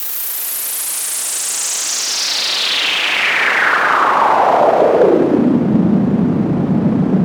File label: Modular, Filtered Noise; noise hiss crackle